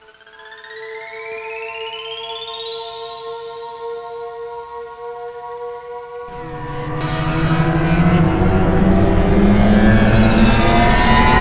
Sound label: ship; svencoop